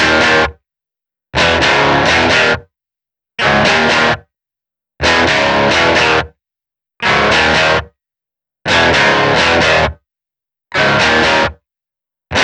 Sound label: Guitar, Rock